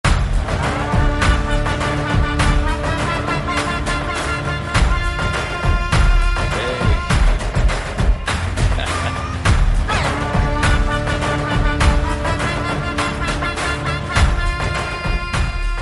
Villain Group Dance Bgm Ringtone; ringtones; Jailer

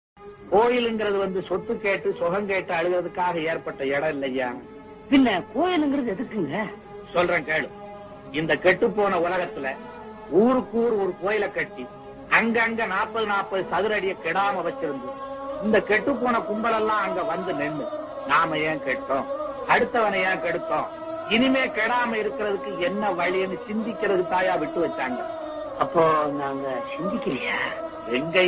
Tamil Ringtone; Thiruchitrambalam

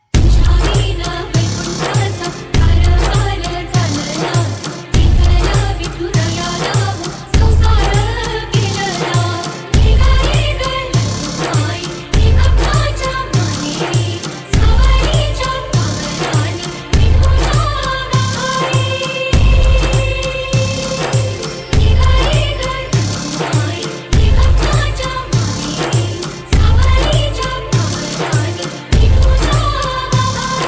Song

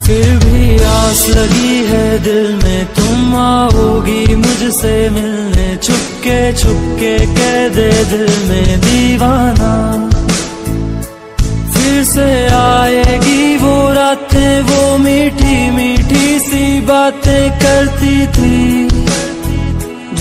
Sad Ringtone

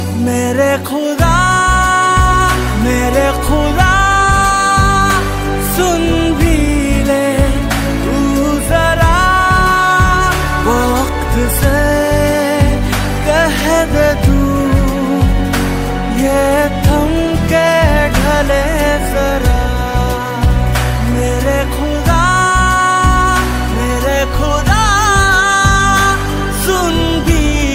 Love Song Ringtone

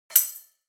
Percussion Sound Message Ringtone; ringtones

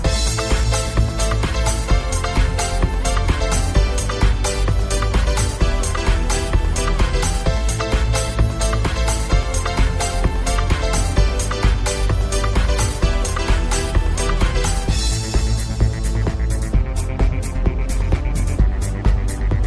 Instrumental Ringtone for Mobile Phone

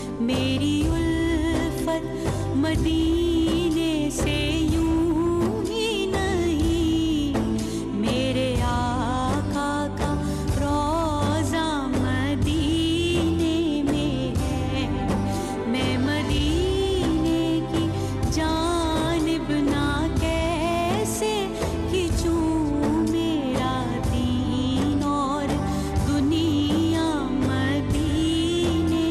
Naat Ringtone

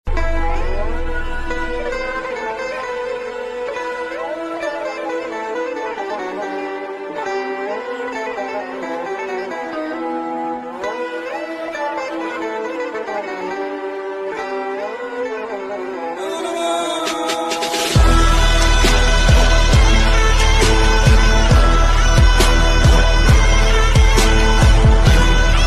Instrumental Background Music Ringtone; ringtones

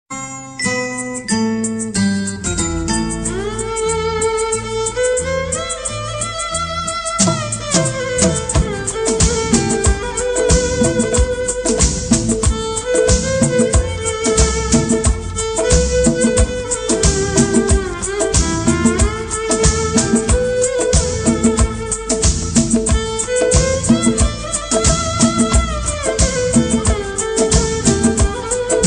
Old Hindi instrumental Ringtone; ringtones

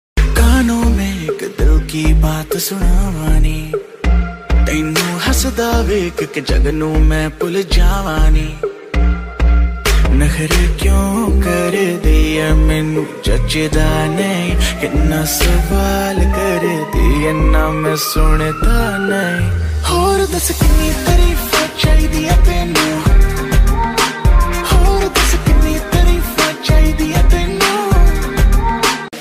Ringtone

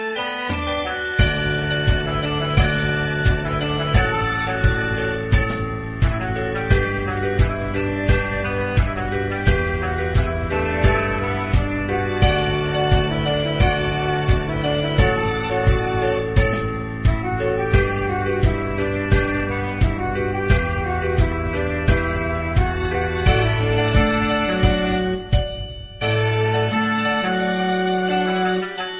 Band Instrumental Ringtone

